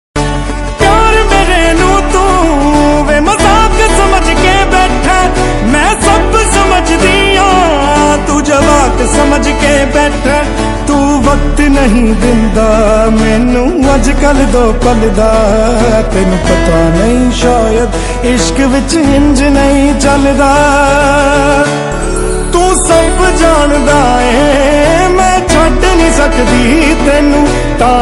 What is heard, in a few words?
Punjabi Song Ringtone Punjabi sad love song ringtone